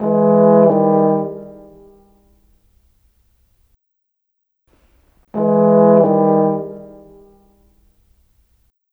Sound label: Horns, Cinematic; Cinematic 06; Orchestral Series